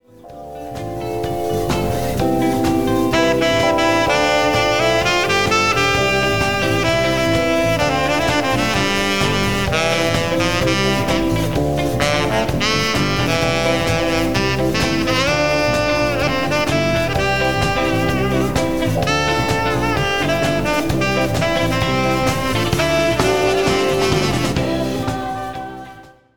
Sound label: solo